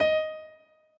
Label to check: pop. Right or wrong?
Wrong